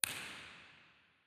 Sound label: S1 Fila 10 10.10m, Auditorium; Auditorium PR; Theatres